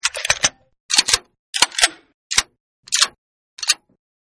Gun Clip Reload, Generic Gun Ammo Clip Reload Sound; 6 Takes; Guns; Gun Handling (Sound Effects:Guns)